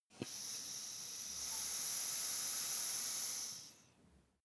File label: air pump ball